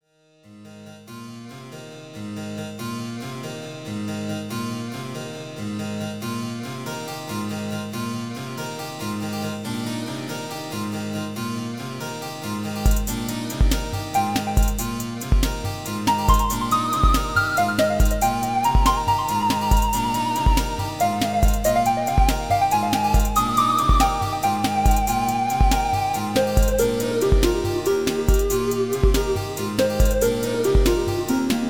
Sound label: worm solo